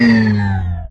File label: hoverbug stop; There; ThereClient